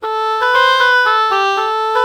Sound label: oh oboe melody morph